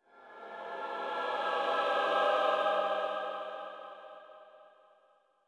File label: Choir